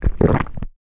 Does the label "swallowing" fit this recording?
Yes